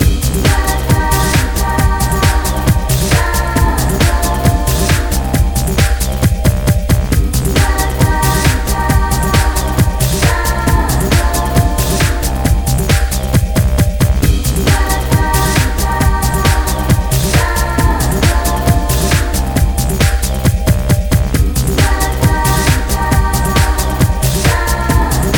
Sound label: Music Game General event